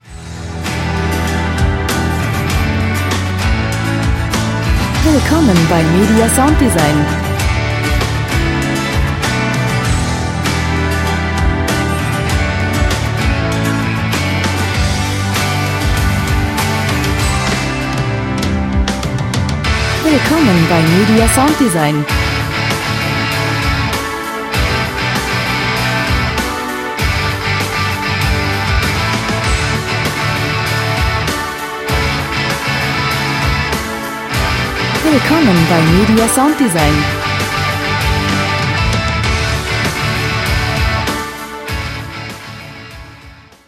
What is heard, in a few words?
rock